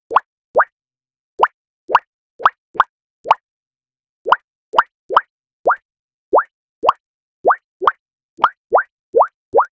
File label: cartoon bubble pop sound